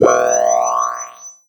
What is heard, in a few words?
Multimedia Internet CD-Rom Flash Blurp